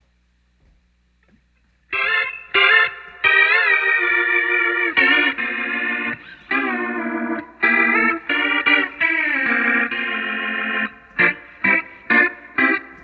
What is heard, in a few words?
Neo Ventilator 2 One Amp Mono